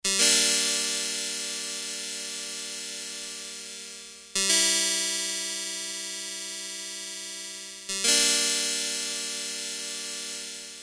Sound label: univox cp 110 electric piano; dr zee workshop